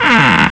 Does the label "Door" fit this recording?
Yes